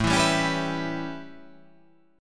A 9th, down

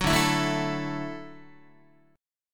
D-Major Add 9th D down Guitar; strummed; JGuitar

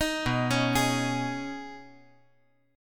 B 6th Add 9th B, chord

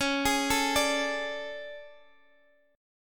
A Major 11th, guitar

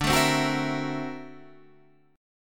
D 7th Flat 13th D, chord; D7b13 strummed; JGuitar

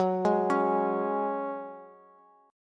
F sharp Suspended 2nd, Bass; arpeggiated; JGuitar

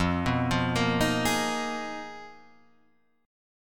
G sharp Minor 6th Add 9th F, guitar chord

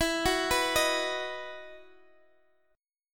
E Major 7th Suspended 2nd E, Guitar, DADGAD; chord shape

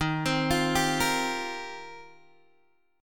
Eb 6th Add 9th Eb, Guitar Open G; x,8,10,10,8,8; down

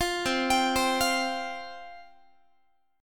C Suspended 4th C Guitar; Csus4 arpeggiated; 20,20,22,22,21,x

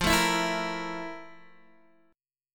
F Major 11th, Guitar, Open D

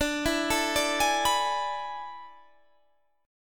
A Major 7th Suspended 2nd Suspended 4th

